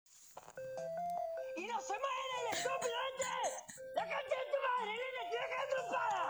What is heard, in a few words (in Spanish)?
gritando; fnaf robleis meme